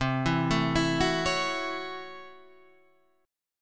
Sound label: A 6th C, A6/C chord; arpeggiated